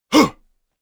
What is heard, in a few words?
attack voice; UFC Tapout